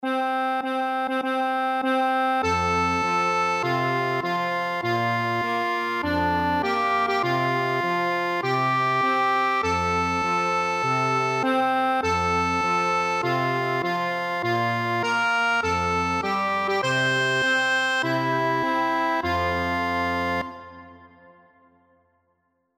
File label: accordion